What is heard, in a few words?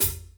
N H.H.F -L, STUDIO ST HH